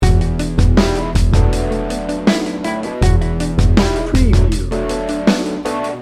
love wins loop

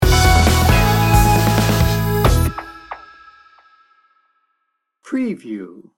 high five sting bumper

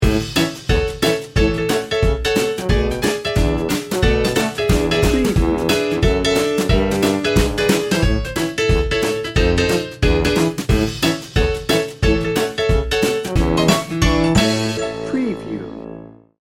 ragtime

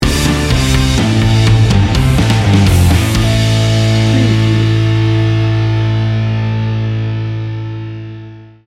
macho tough sting bumper

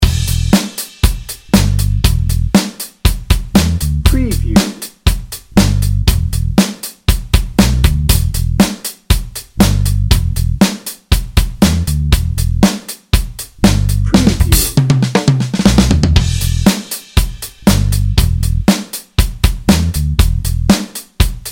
funky guitar bounce dnb

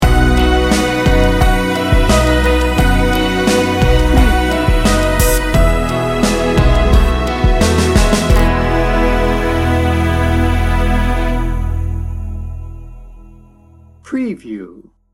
rain on the roof sting bumper

